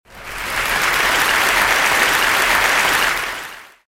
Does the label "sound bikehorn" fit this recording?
No